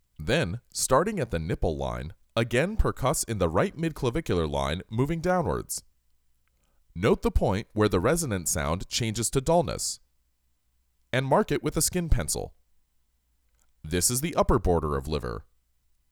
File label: Percussion; Ab Percussion